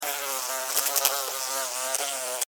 Insect House Fly Wings Fly By Electrical Source Zap